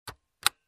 Slow key press

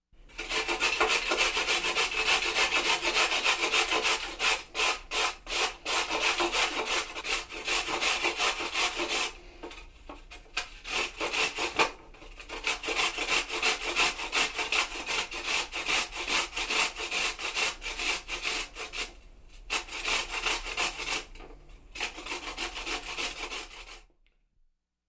Kitchen Sink cleaning

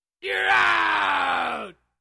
Angry scream

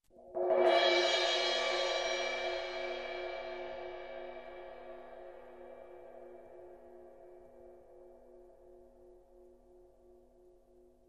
Cymbal Swell

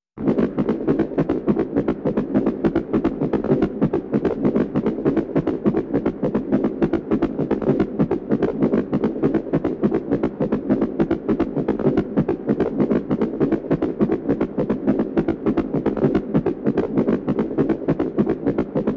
Horror Ambience heartbeat-like sound

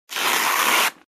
Tearing off sound

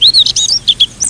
Robin chirping

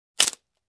Cracking Stick

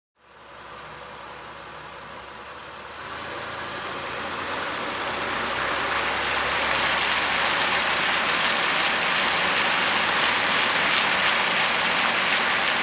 Car driving on wet road

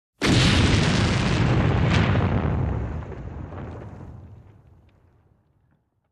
Fire Explosion near

